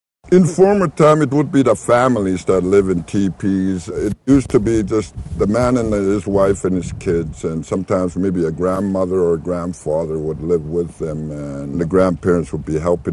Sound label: Plains